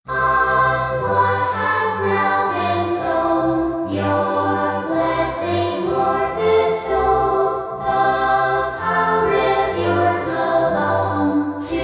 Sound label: choir